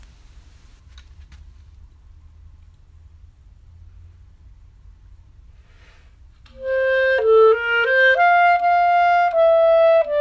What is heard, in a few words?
Clarinet Andante; 8th grade all state